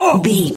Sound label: voice male hurt pain